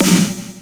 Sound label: snare